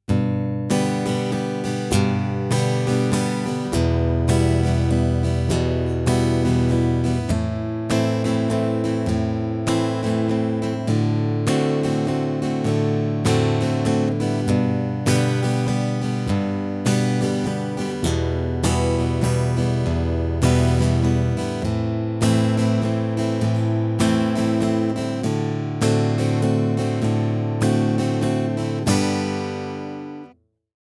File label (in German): Anschlag, begleitung; Schlagmuster 5; Begleitgitarre; Hörbeispiele Begleitgitarre